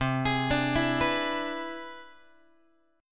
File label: C Augmented Major 9th, Guitar; arpeggiated; JGuitar